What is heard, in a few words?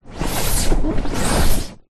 boat rubber dinghy small drag pull rub hard ground